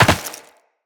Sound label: land rock, footsteps; Characters; Tenno